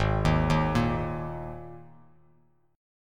G sharp Suspended 2nd, G sharp, down, Bass Standard; G#sus2; arpeggiated; JGuitar